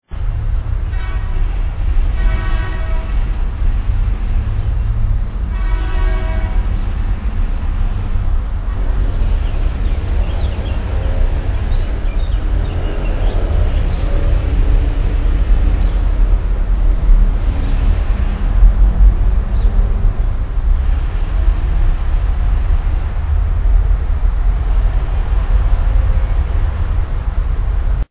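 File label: Horn traffic light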